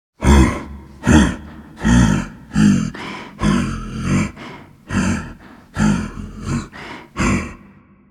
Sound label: Voices Mob walk; gromilaM2